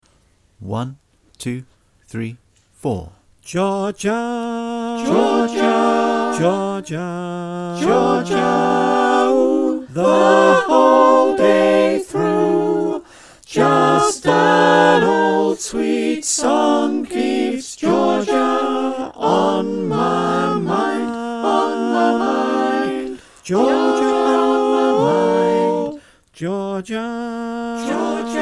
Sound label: SATB Mix